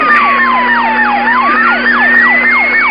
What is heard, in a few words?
Danger Alarm Sound